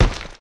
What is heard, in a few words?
dung ball bounce; Hollow Knight